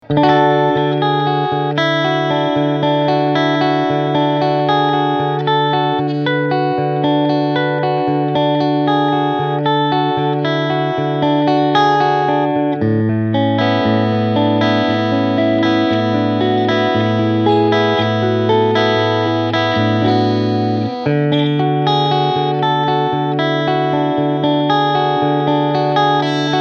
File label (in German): Peavey Solo Cleansound High Boost